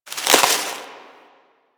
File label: Tree Branch Break; Ambience; One Offs; Warframe; Quests; The New War; Drifter Camp